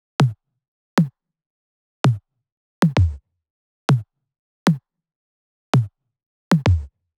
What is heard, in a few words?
130BPM Kit; Vengeance Samples; Take Top Kit